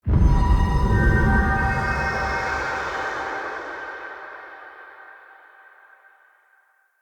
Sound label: Secret Uncovered Hint; Puzzles